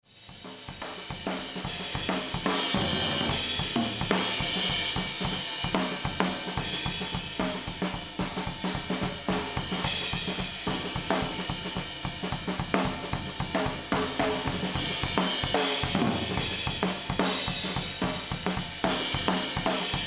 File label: Drums Kit Mic Comp On